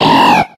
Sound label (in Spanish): Grito de Barboach